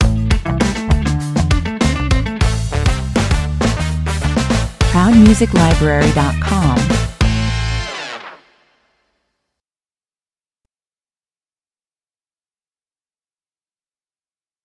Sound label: the funk stinger